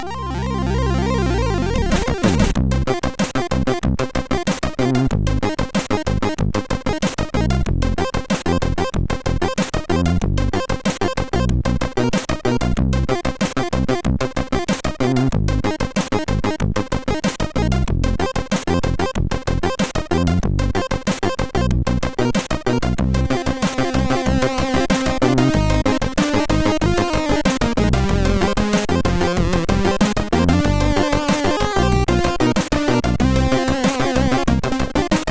Scream, MOS6581R4; sid

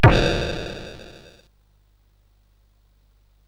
Taipan sfx, CSG8580R5

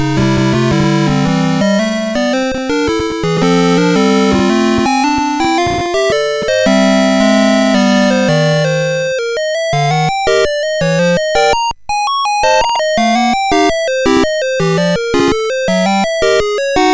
Musicbox, sid MOS6581R4

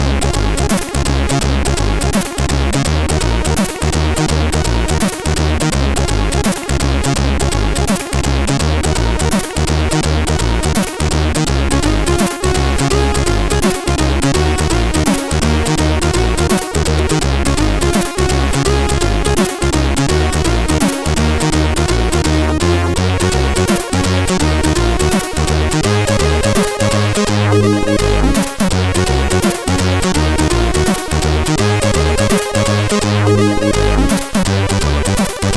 Slow Down CSG8580R5; hvsc

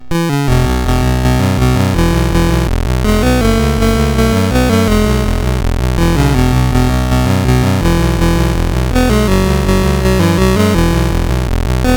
Fjaeril Vingad, sid CSG8580R5

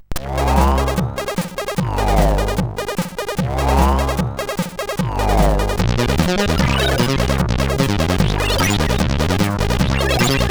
Banjo, CSG8580R5

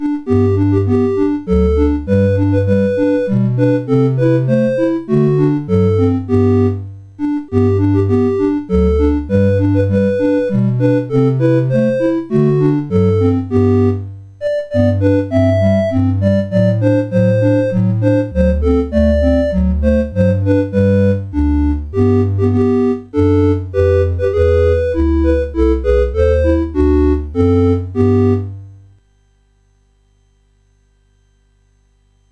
Season Jingles, CSG 8580; CSG8580R5

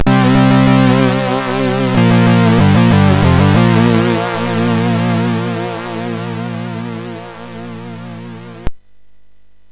sid MOS6581R4; Platou

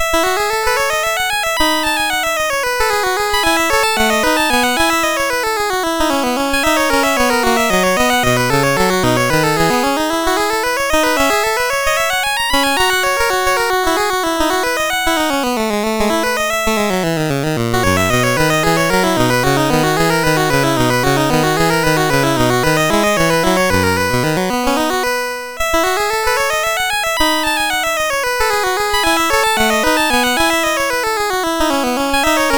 Courante, MOS6581R4; Joseph A Grau; Sixth French Suite

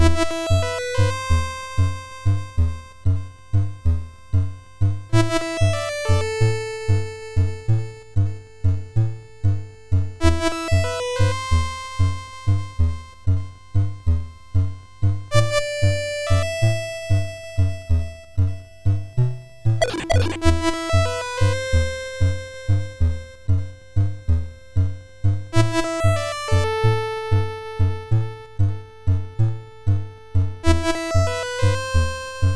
Drone Processor, MOS6581R2; sid

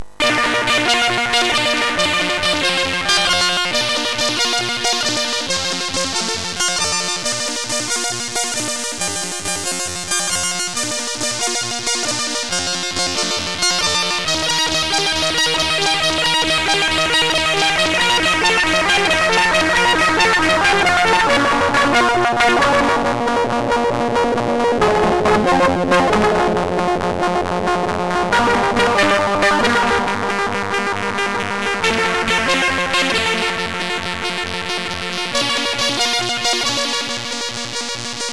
Hocus Focus, MOS6581R4

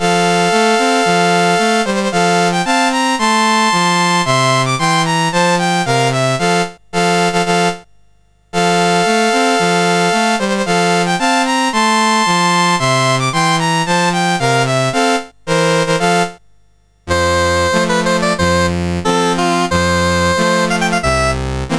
Saudi Arabia, National Anthems, CSG8580R5; Dave Marquis

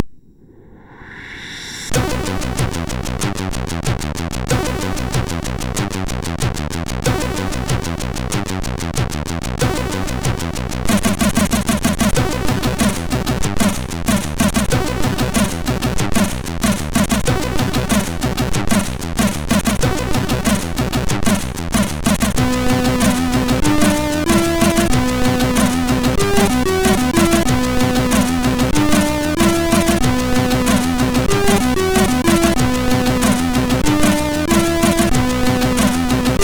Techno Chaos, sid MOS6581R2

